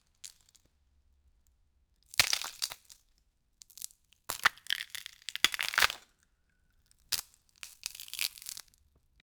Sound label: broke bones broke bones, injury